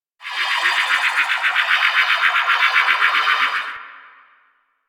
Hover B; Warframe; Quests; The New War; Lotus Gem Part One

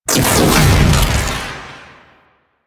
Omicrus Proj Perfect Shot Layer; Warframe; Quests; The New War; Weapons

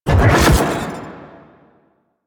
Pulse Cast; DE; Warframe; Warframes; Gyre

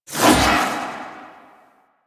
Doom Sword Whoosh; Warframe; Excalibur

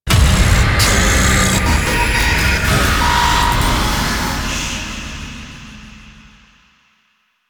Survival Hack Completed; Warframe; Entrati

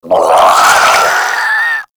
Infested Crawler Death; Warframe

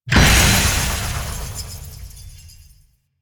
Death Explosion

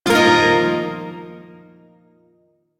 Shawzin Pent Min Chord; DE; Warframe; Duviri Shawzin

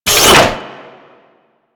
Drone Face Shield Close; Warframe; Vay Hek

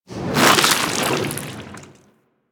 Landscape Fungus Drop; Warframe; Ambience; Venus Open World

